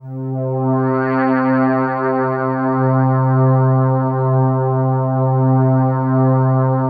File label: DENSE FL, PADS; Masterbits; Vintage Voltage